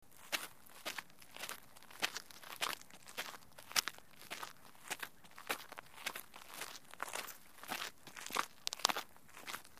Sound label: steps gravel small; FOTSTEG; UTOMHUS